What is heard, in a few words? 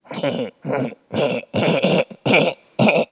hehe